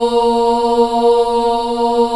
A#2 CHILD, Choir; USB Soundscan vol.28; Acoustic & Synth; AKAI

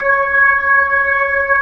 B-3 Jazz C#6; Keyboards of The 60's and 70's

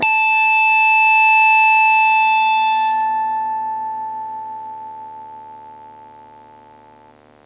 Blues Pick A5, Blues Guitar

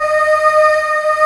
VOICE, FAIR CHOIR

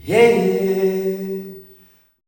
SOFT INTRO, MALE; Spectrasonics; Heart of Africa 2; S.MALEAMB

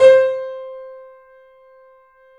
The Piano; InVision Interactive - The Piano

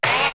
Hit; ZERO G - Datafile DISC 1

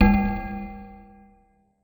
Tone, IDM; Sonic Foundry (Sony Creative Software); Twine-IDM

